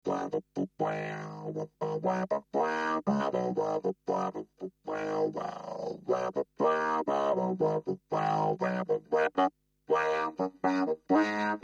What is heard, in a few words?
Funk Vocoder